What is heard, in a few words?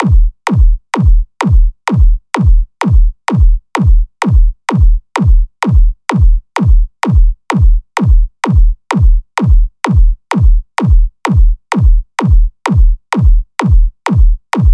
Beat Gorilla 128 bpm